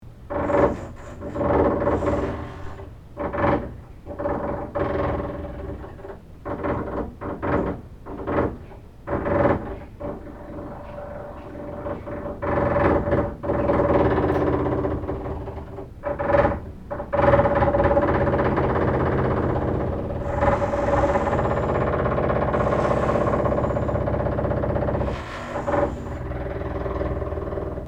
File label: House renovation ambience hammering and drilling